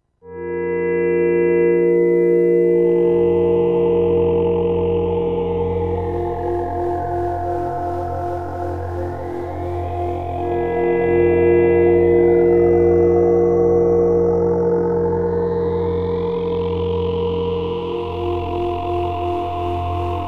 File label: ambient